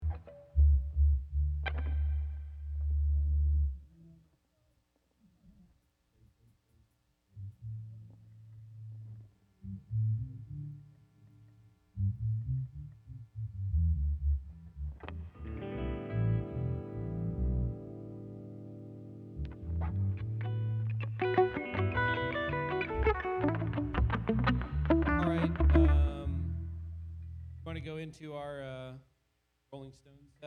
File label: Strings Emphasis Mix